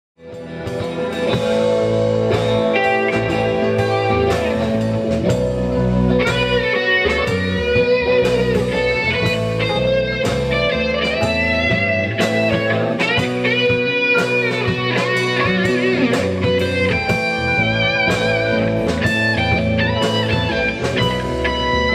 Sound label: Cymbaline; Pacific Rim Brewery